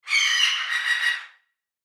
Bird Squawk Indoor, Bird Squawk Indoor Distant Multiple; Animal; Blastwave